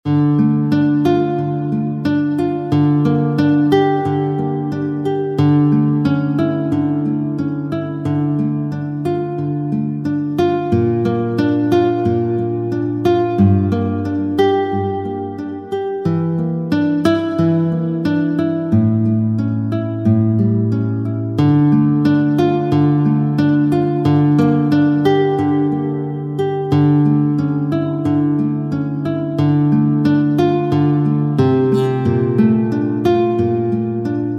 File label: sweet guit minor